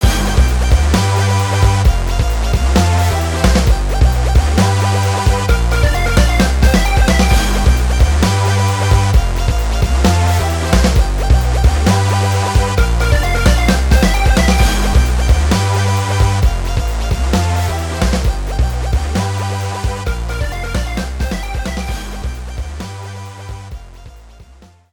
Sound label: Music event; Power Pro Kun Pocket R